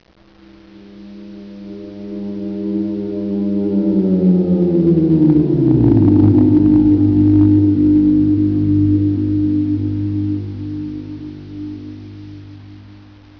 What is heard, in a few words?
City; WARLOCKSNDS